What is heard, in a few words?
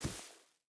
footstep rubber boots grass run; Rust